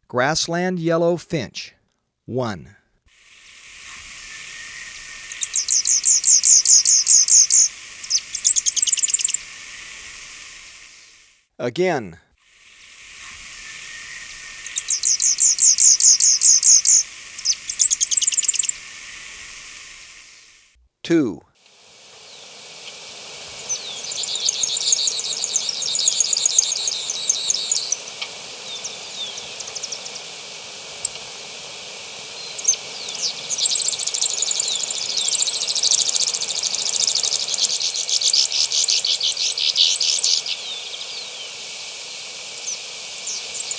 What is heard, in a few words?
Grassland Yellow-Finch, Birds of Ecuador; S. America